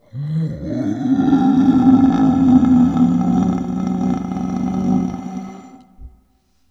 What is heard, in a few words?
Voices monster moan; Massive Cleavage vs. Zombies Awesome Edition